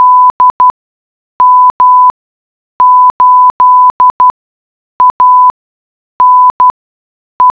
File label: morse text